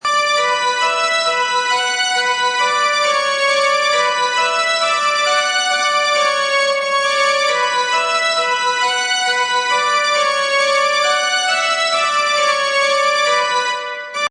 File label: Horr Violin Bm, Violin horror loop in B minor at 135 bpm.